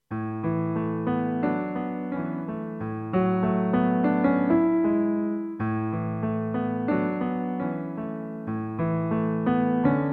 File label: accompaniment